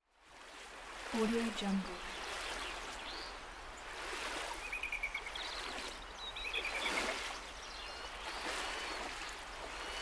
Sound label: mountain lake; dubstudio